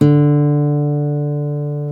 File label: GTR nylon; 90's Sample CDs; Club-50 - Foundations Roland; Nylon Martin; Nylon 1